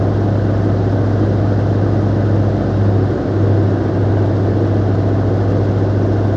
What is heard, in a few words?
airplane medium idle; Hearts of Iron IV Death or Dishonor